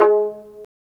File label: Pizz, String; Vlas Pizz; Vas Pizz; Roland - String Master Series